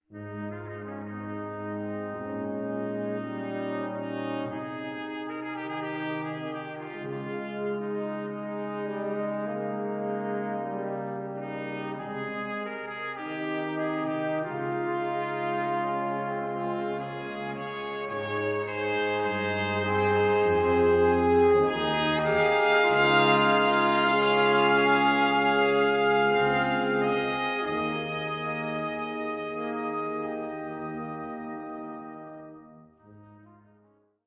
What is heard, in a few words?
oboe